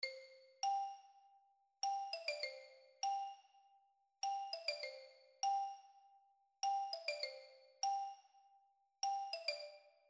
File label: Ostinato; composing at home